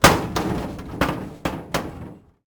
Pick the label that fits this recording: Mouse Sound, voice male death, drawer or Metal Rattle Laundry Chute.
Metal Rattle Laundry Chute